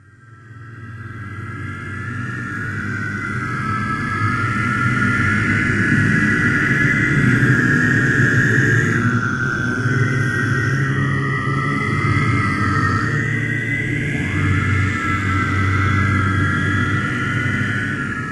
chorus; episodic; episode 1; intro; vort; Source engine

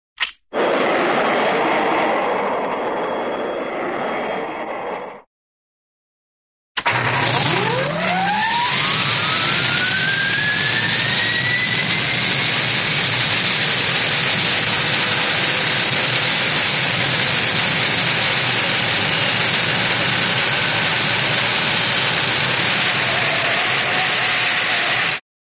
sci fi rocket click start; cartoon

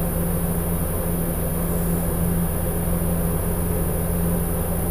ac vent, ambient; Source Engine; tf; lair

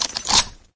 bolt back, weapons; source engine; dod

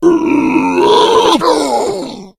boomer death

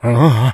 become alert, infected

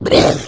boomer pain short

